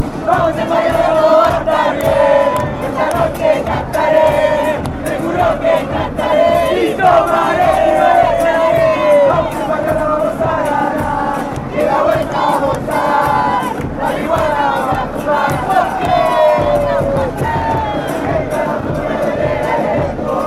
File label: union chant